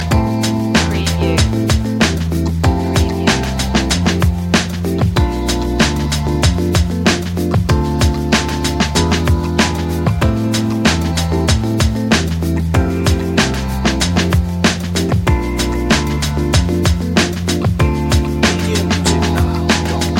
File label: seaside lounge loop